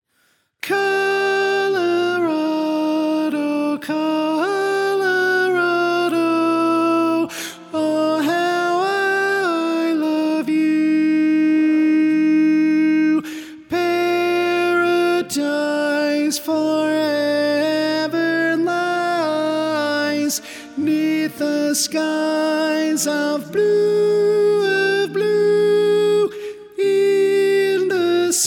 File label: Tenor